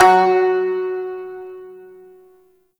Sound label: Shawzin Oct One F Sharp; Warframe